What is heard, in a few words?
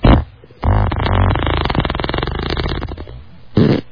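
farting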